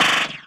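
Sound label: impact bullet generic titan shield; Titanfall 2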